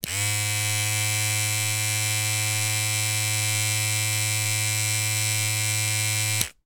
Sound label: household trimmer facial hair on run off; sound effects one